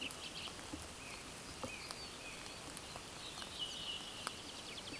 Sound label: Turdus iliacus